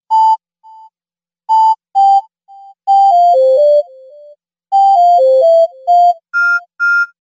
samsung flute ringtone

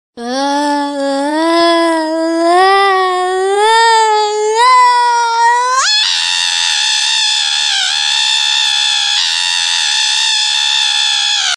annoying child ringtone

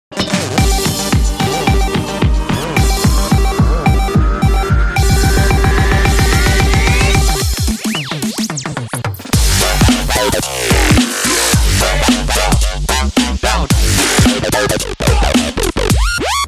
multi phone dubstep ringtone

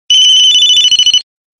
small beep call notification tone ringtone

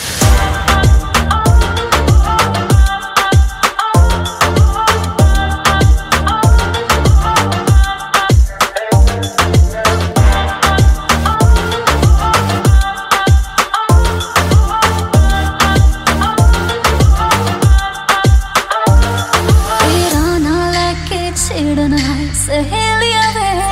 remix hindi ringtone

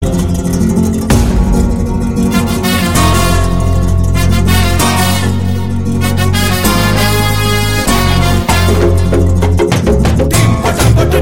don remix ringtone, bollywood

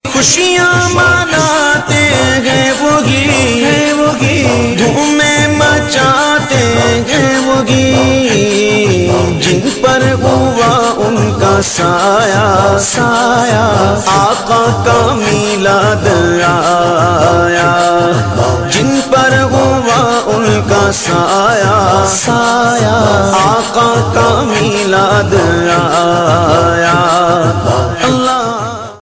allah allah rasool ringtone

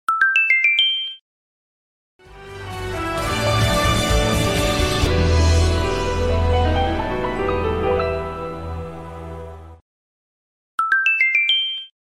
coolest sms ringtone

